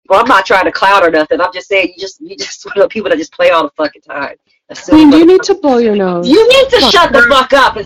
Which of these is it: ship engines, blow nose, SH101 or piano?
blow nose